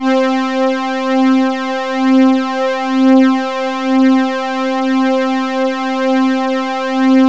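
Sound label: Roland JX3 strings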